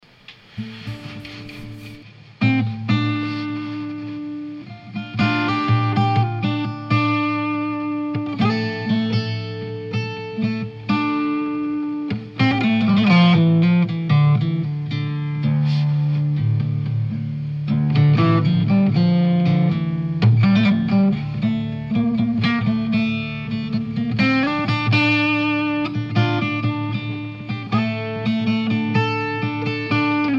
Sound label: D 120 GTR PERC groove